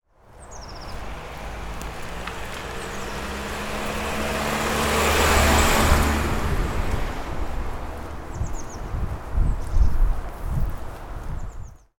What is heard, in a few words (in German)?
auto und wind; zoom h6